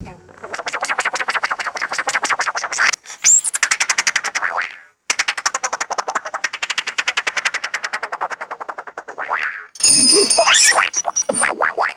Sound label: comical cartoon sound effects sequence; clocks